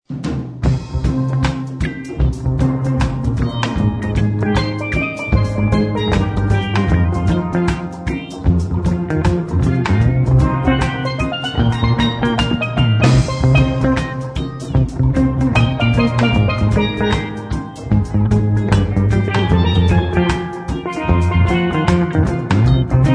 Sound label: jazz fusion on steel